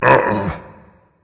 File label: disagree berserker; cuchulainn